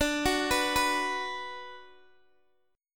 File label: D 6th D down Guitar Open D, chord